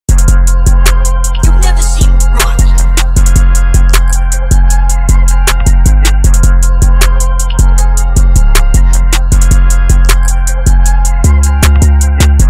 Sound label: Instrumental